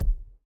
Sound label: Ball Player Hand Hit Tackleball Extra Light